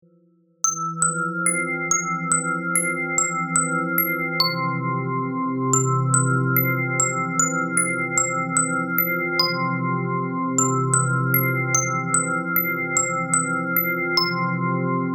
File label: gentle glass bells ringtone